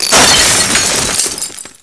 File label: shatter; ricochet